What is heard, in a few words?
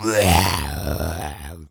Zombie Groan; Glitchedtones; Zombie Subject B